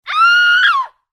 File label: SCREAM